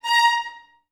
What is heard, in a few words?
A#4, Advanced Orchestra; Best Service